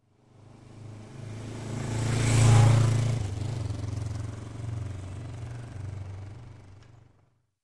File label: Motorcycle, 400cc Pass By, Slow; Sound Ideas; Series 2000